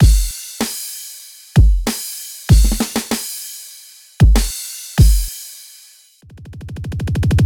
Perc Break

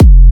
Kick F full note

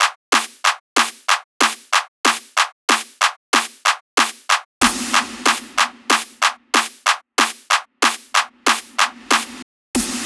Clap Snares

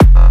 KICK BASS F ONE SHOT

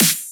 Tek Snare

